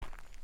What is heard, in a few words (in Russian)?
Шагая по гравийной грунтовой дороге; Движение по гравийной грунтовой дороге; Передвижение по гравийной грунтовой дороге